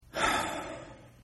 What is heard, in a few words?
Sigh